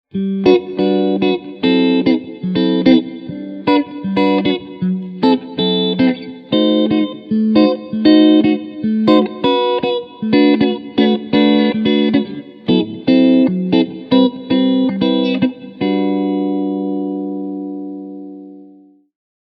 Clean SC Front HB Steg

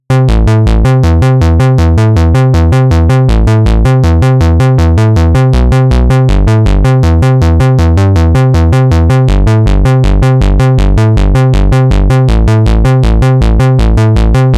Druckbass; Beepstreet Dagger

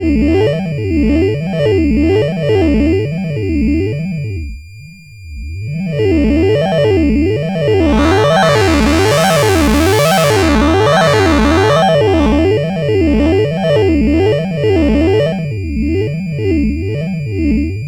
DUAL LFO Modulation im hörbaren Bereich; GRP Module

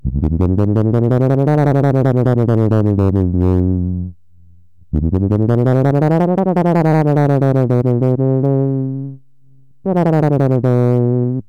UniSyn Schnell

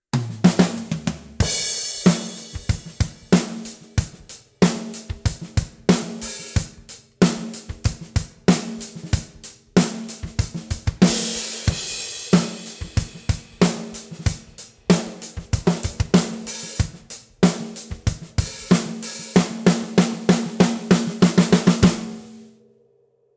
LB552 tief offen

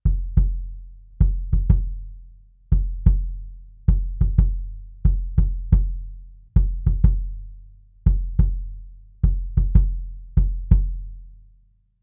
sE Electronics T2 Bass Drum Niere

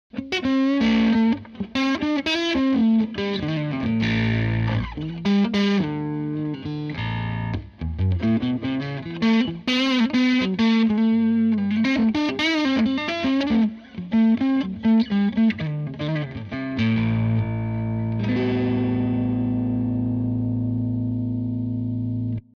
hals dist

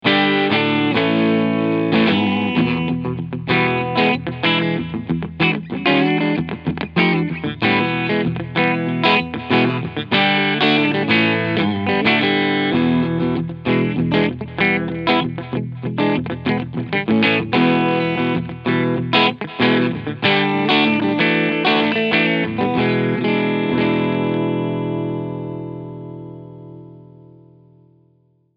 Gibson LP Special Tribute P-90 E-Gitarre beide P90 Clean

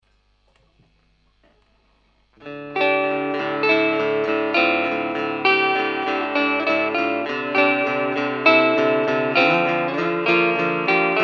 Cort Hals Pickup clean

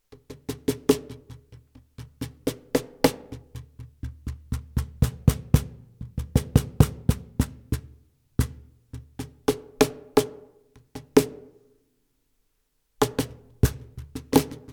Meinl Cajon Test CAJ1WN M mit Teppich

